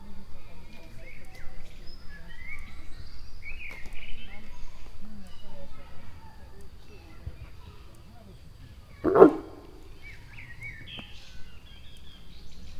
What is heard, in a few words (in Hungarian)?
egyet mordul, oroszlán; allathangok; veszpremizoo 2013